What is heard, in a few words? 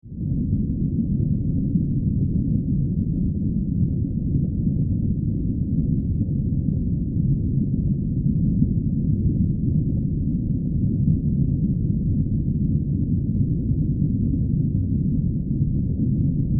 Water Underwater Submarine Exterior Ambience; Water Sound Effects; Water (Sound Effects:Nature)